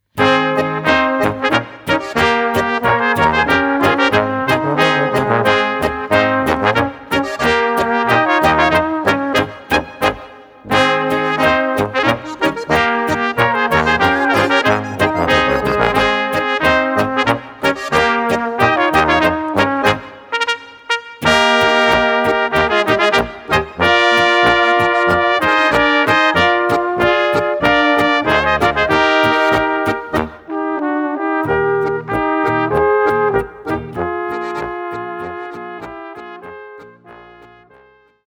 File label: Polka